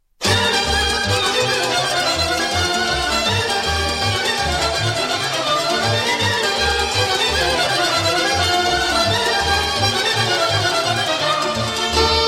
Sirba de la Filipesti, Roumanie

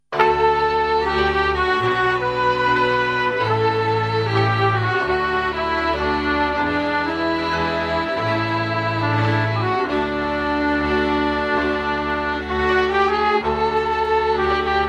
Potpuriu de melodii, Roumanie

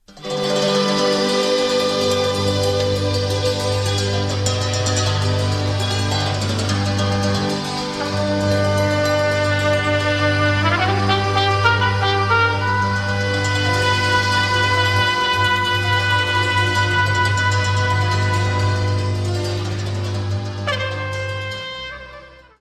Suita de Jocuri si Cantece din Banat - Roumanie

